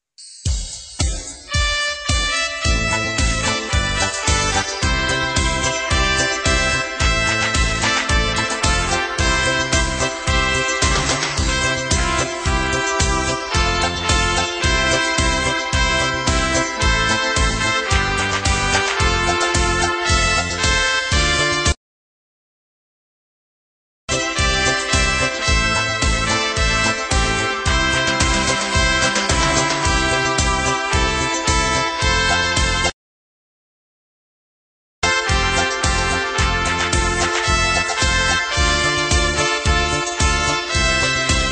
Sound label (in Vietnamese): nhac khong loi beat